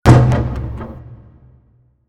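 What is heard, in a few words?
basketball backboard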